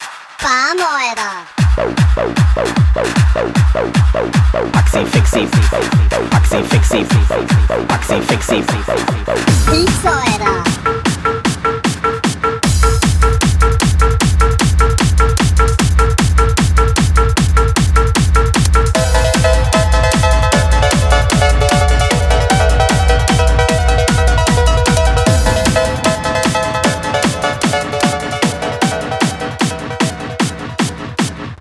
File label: hardstyle pitched version, ringtone